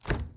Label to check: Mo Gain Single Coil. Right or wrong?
Wrong